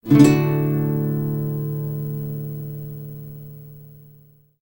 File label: guitar; pendula swing the complete journey